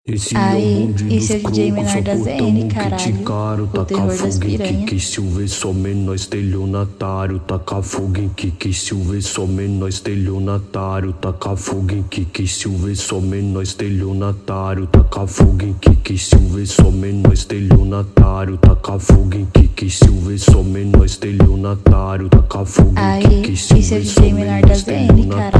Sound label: beat